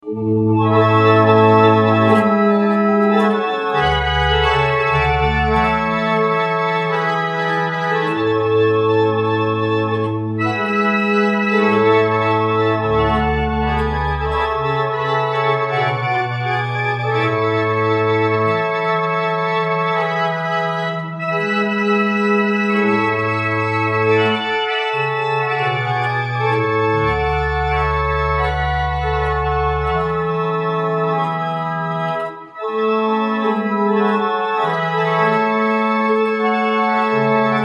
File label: barrel organs; Huttoft